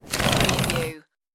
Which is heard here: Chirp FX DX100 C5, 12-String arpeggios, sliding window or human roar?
sliding window